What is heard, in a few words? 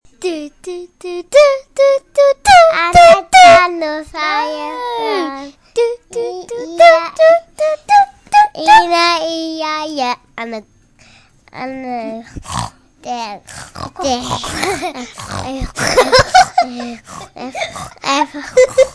sings Old Macdonalds